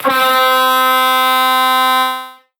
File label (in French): SON KLAXON TROMPE BASE RECTANGULAIRE CHROME; HADLEY